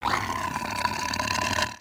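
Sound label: Sabertooth Idle; creatures; pets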